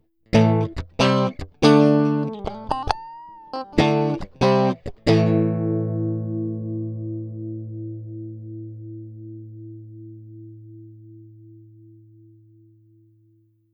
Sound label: Dist Dry; tube